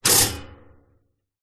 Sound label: household toaster modern pop up